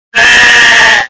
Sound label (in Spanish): Grito de Dubwool